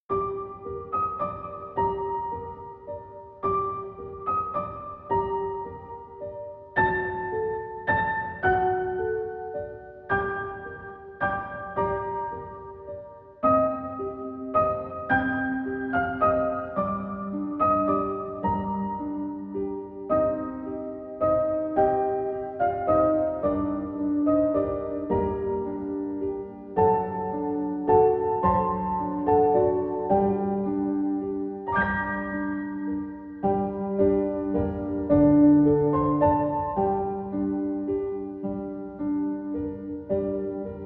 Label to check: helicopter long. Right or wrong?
Wrong